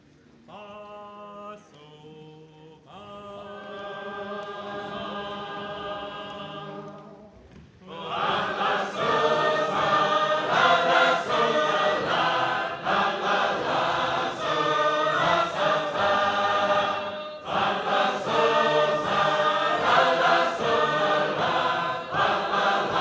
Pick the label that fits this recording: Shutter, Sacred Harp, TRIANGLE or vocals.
Sacred Harp